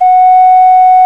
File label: OCARINA F#2; Exotic Winds